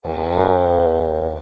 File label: aggro, monstres; deadmaze